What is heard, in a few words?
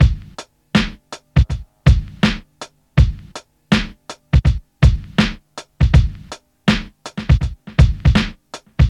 108 bpm 90s breakbeat sample c sharp key, Free breakbeat - kick tuned to the C# note.